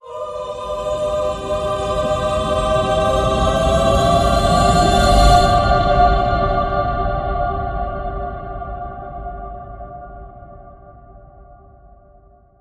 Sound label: Bend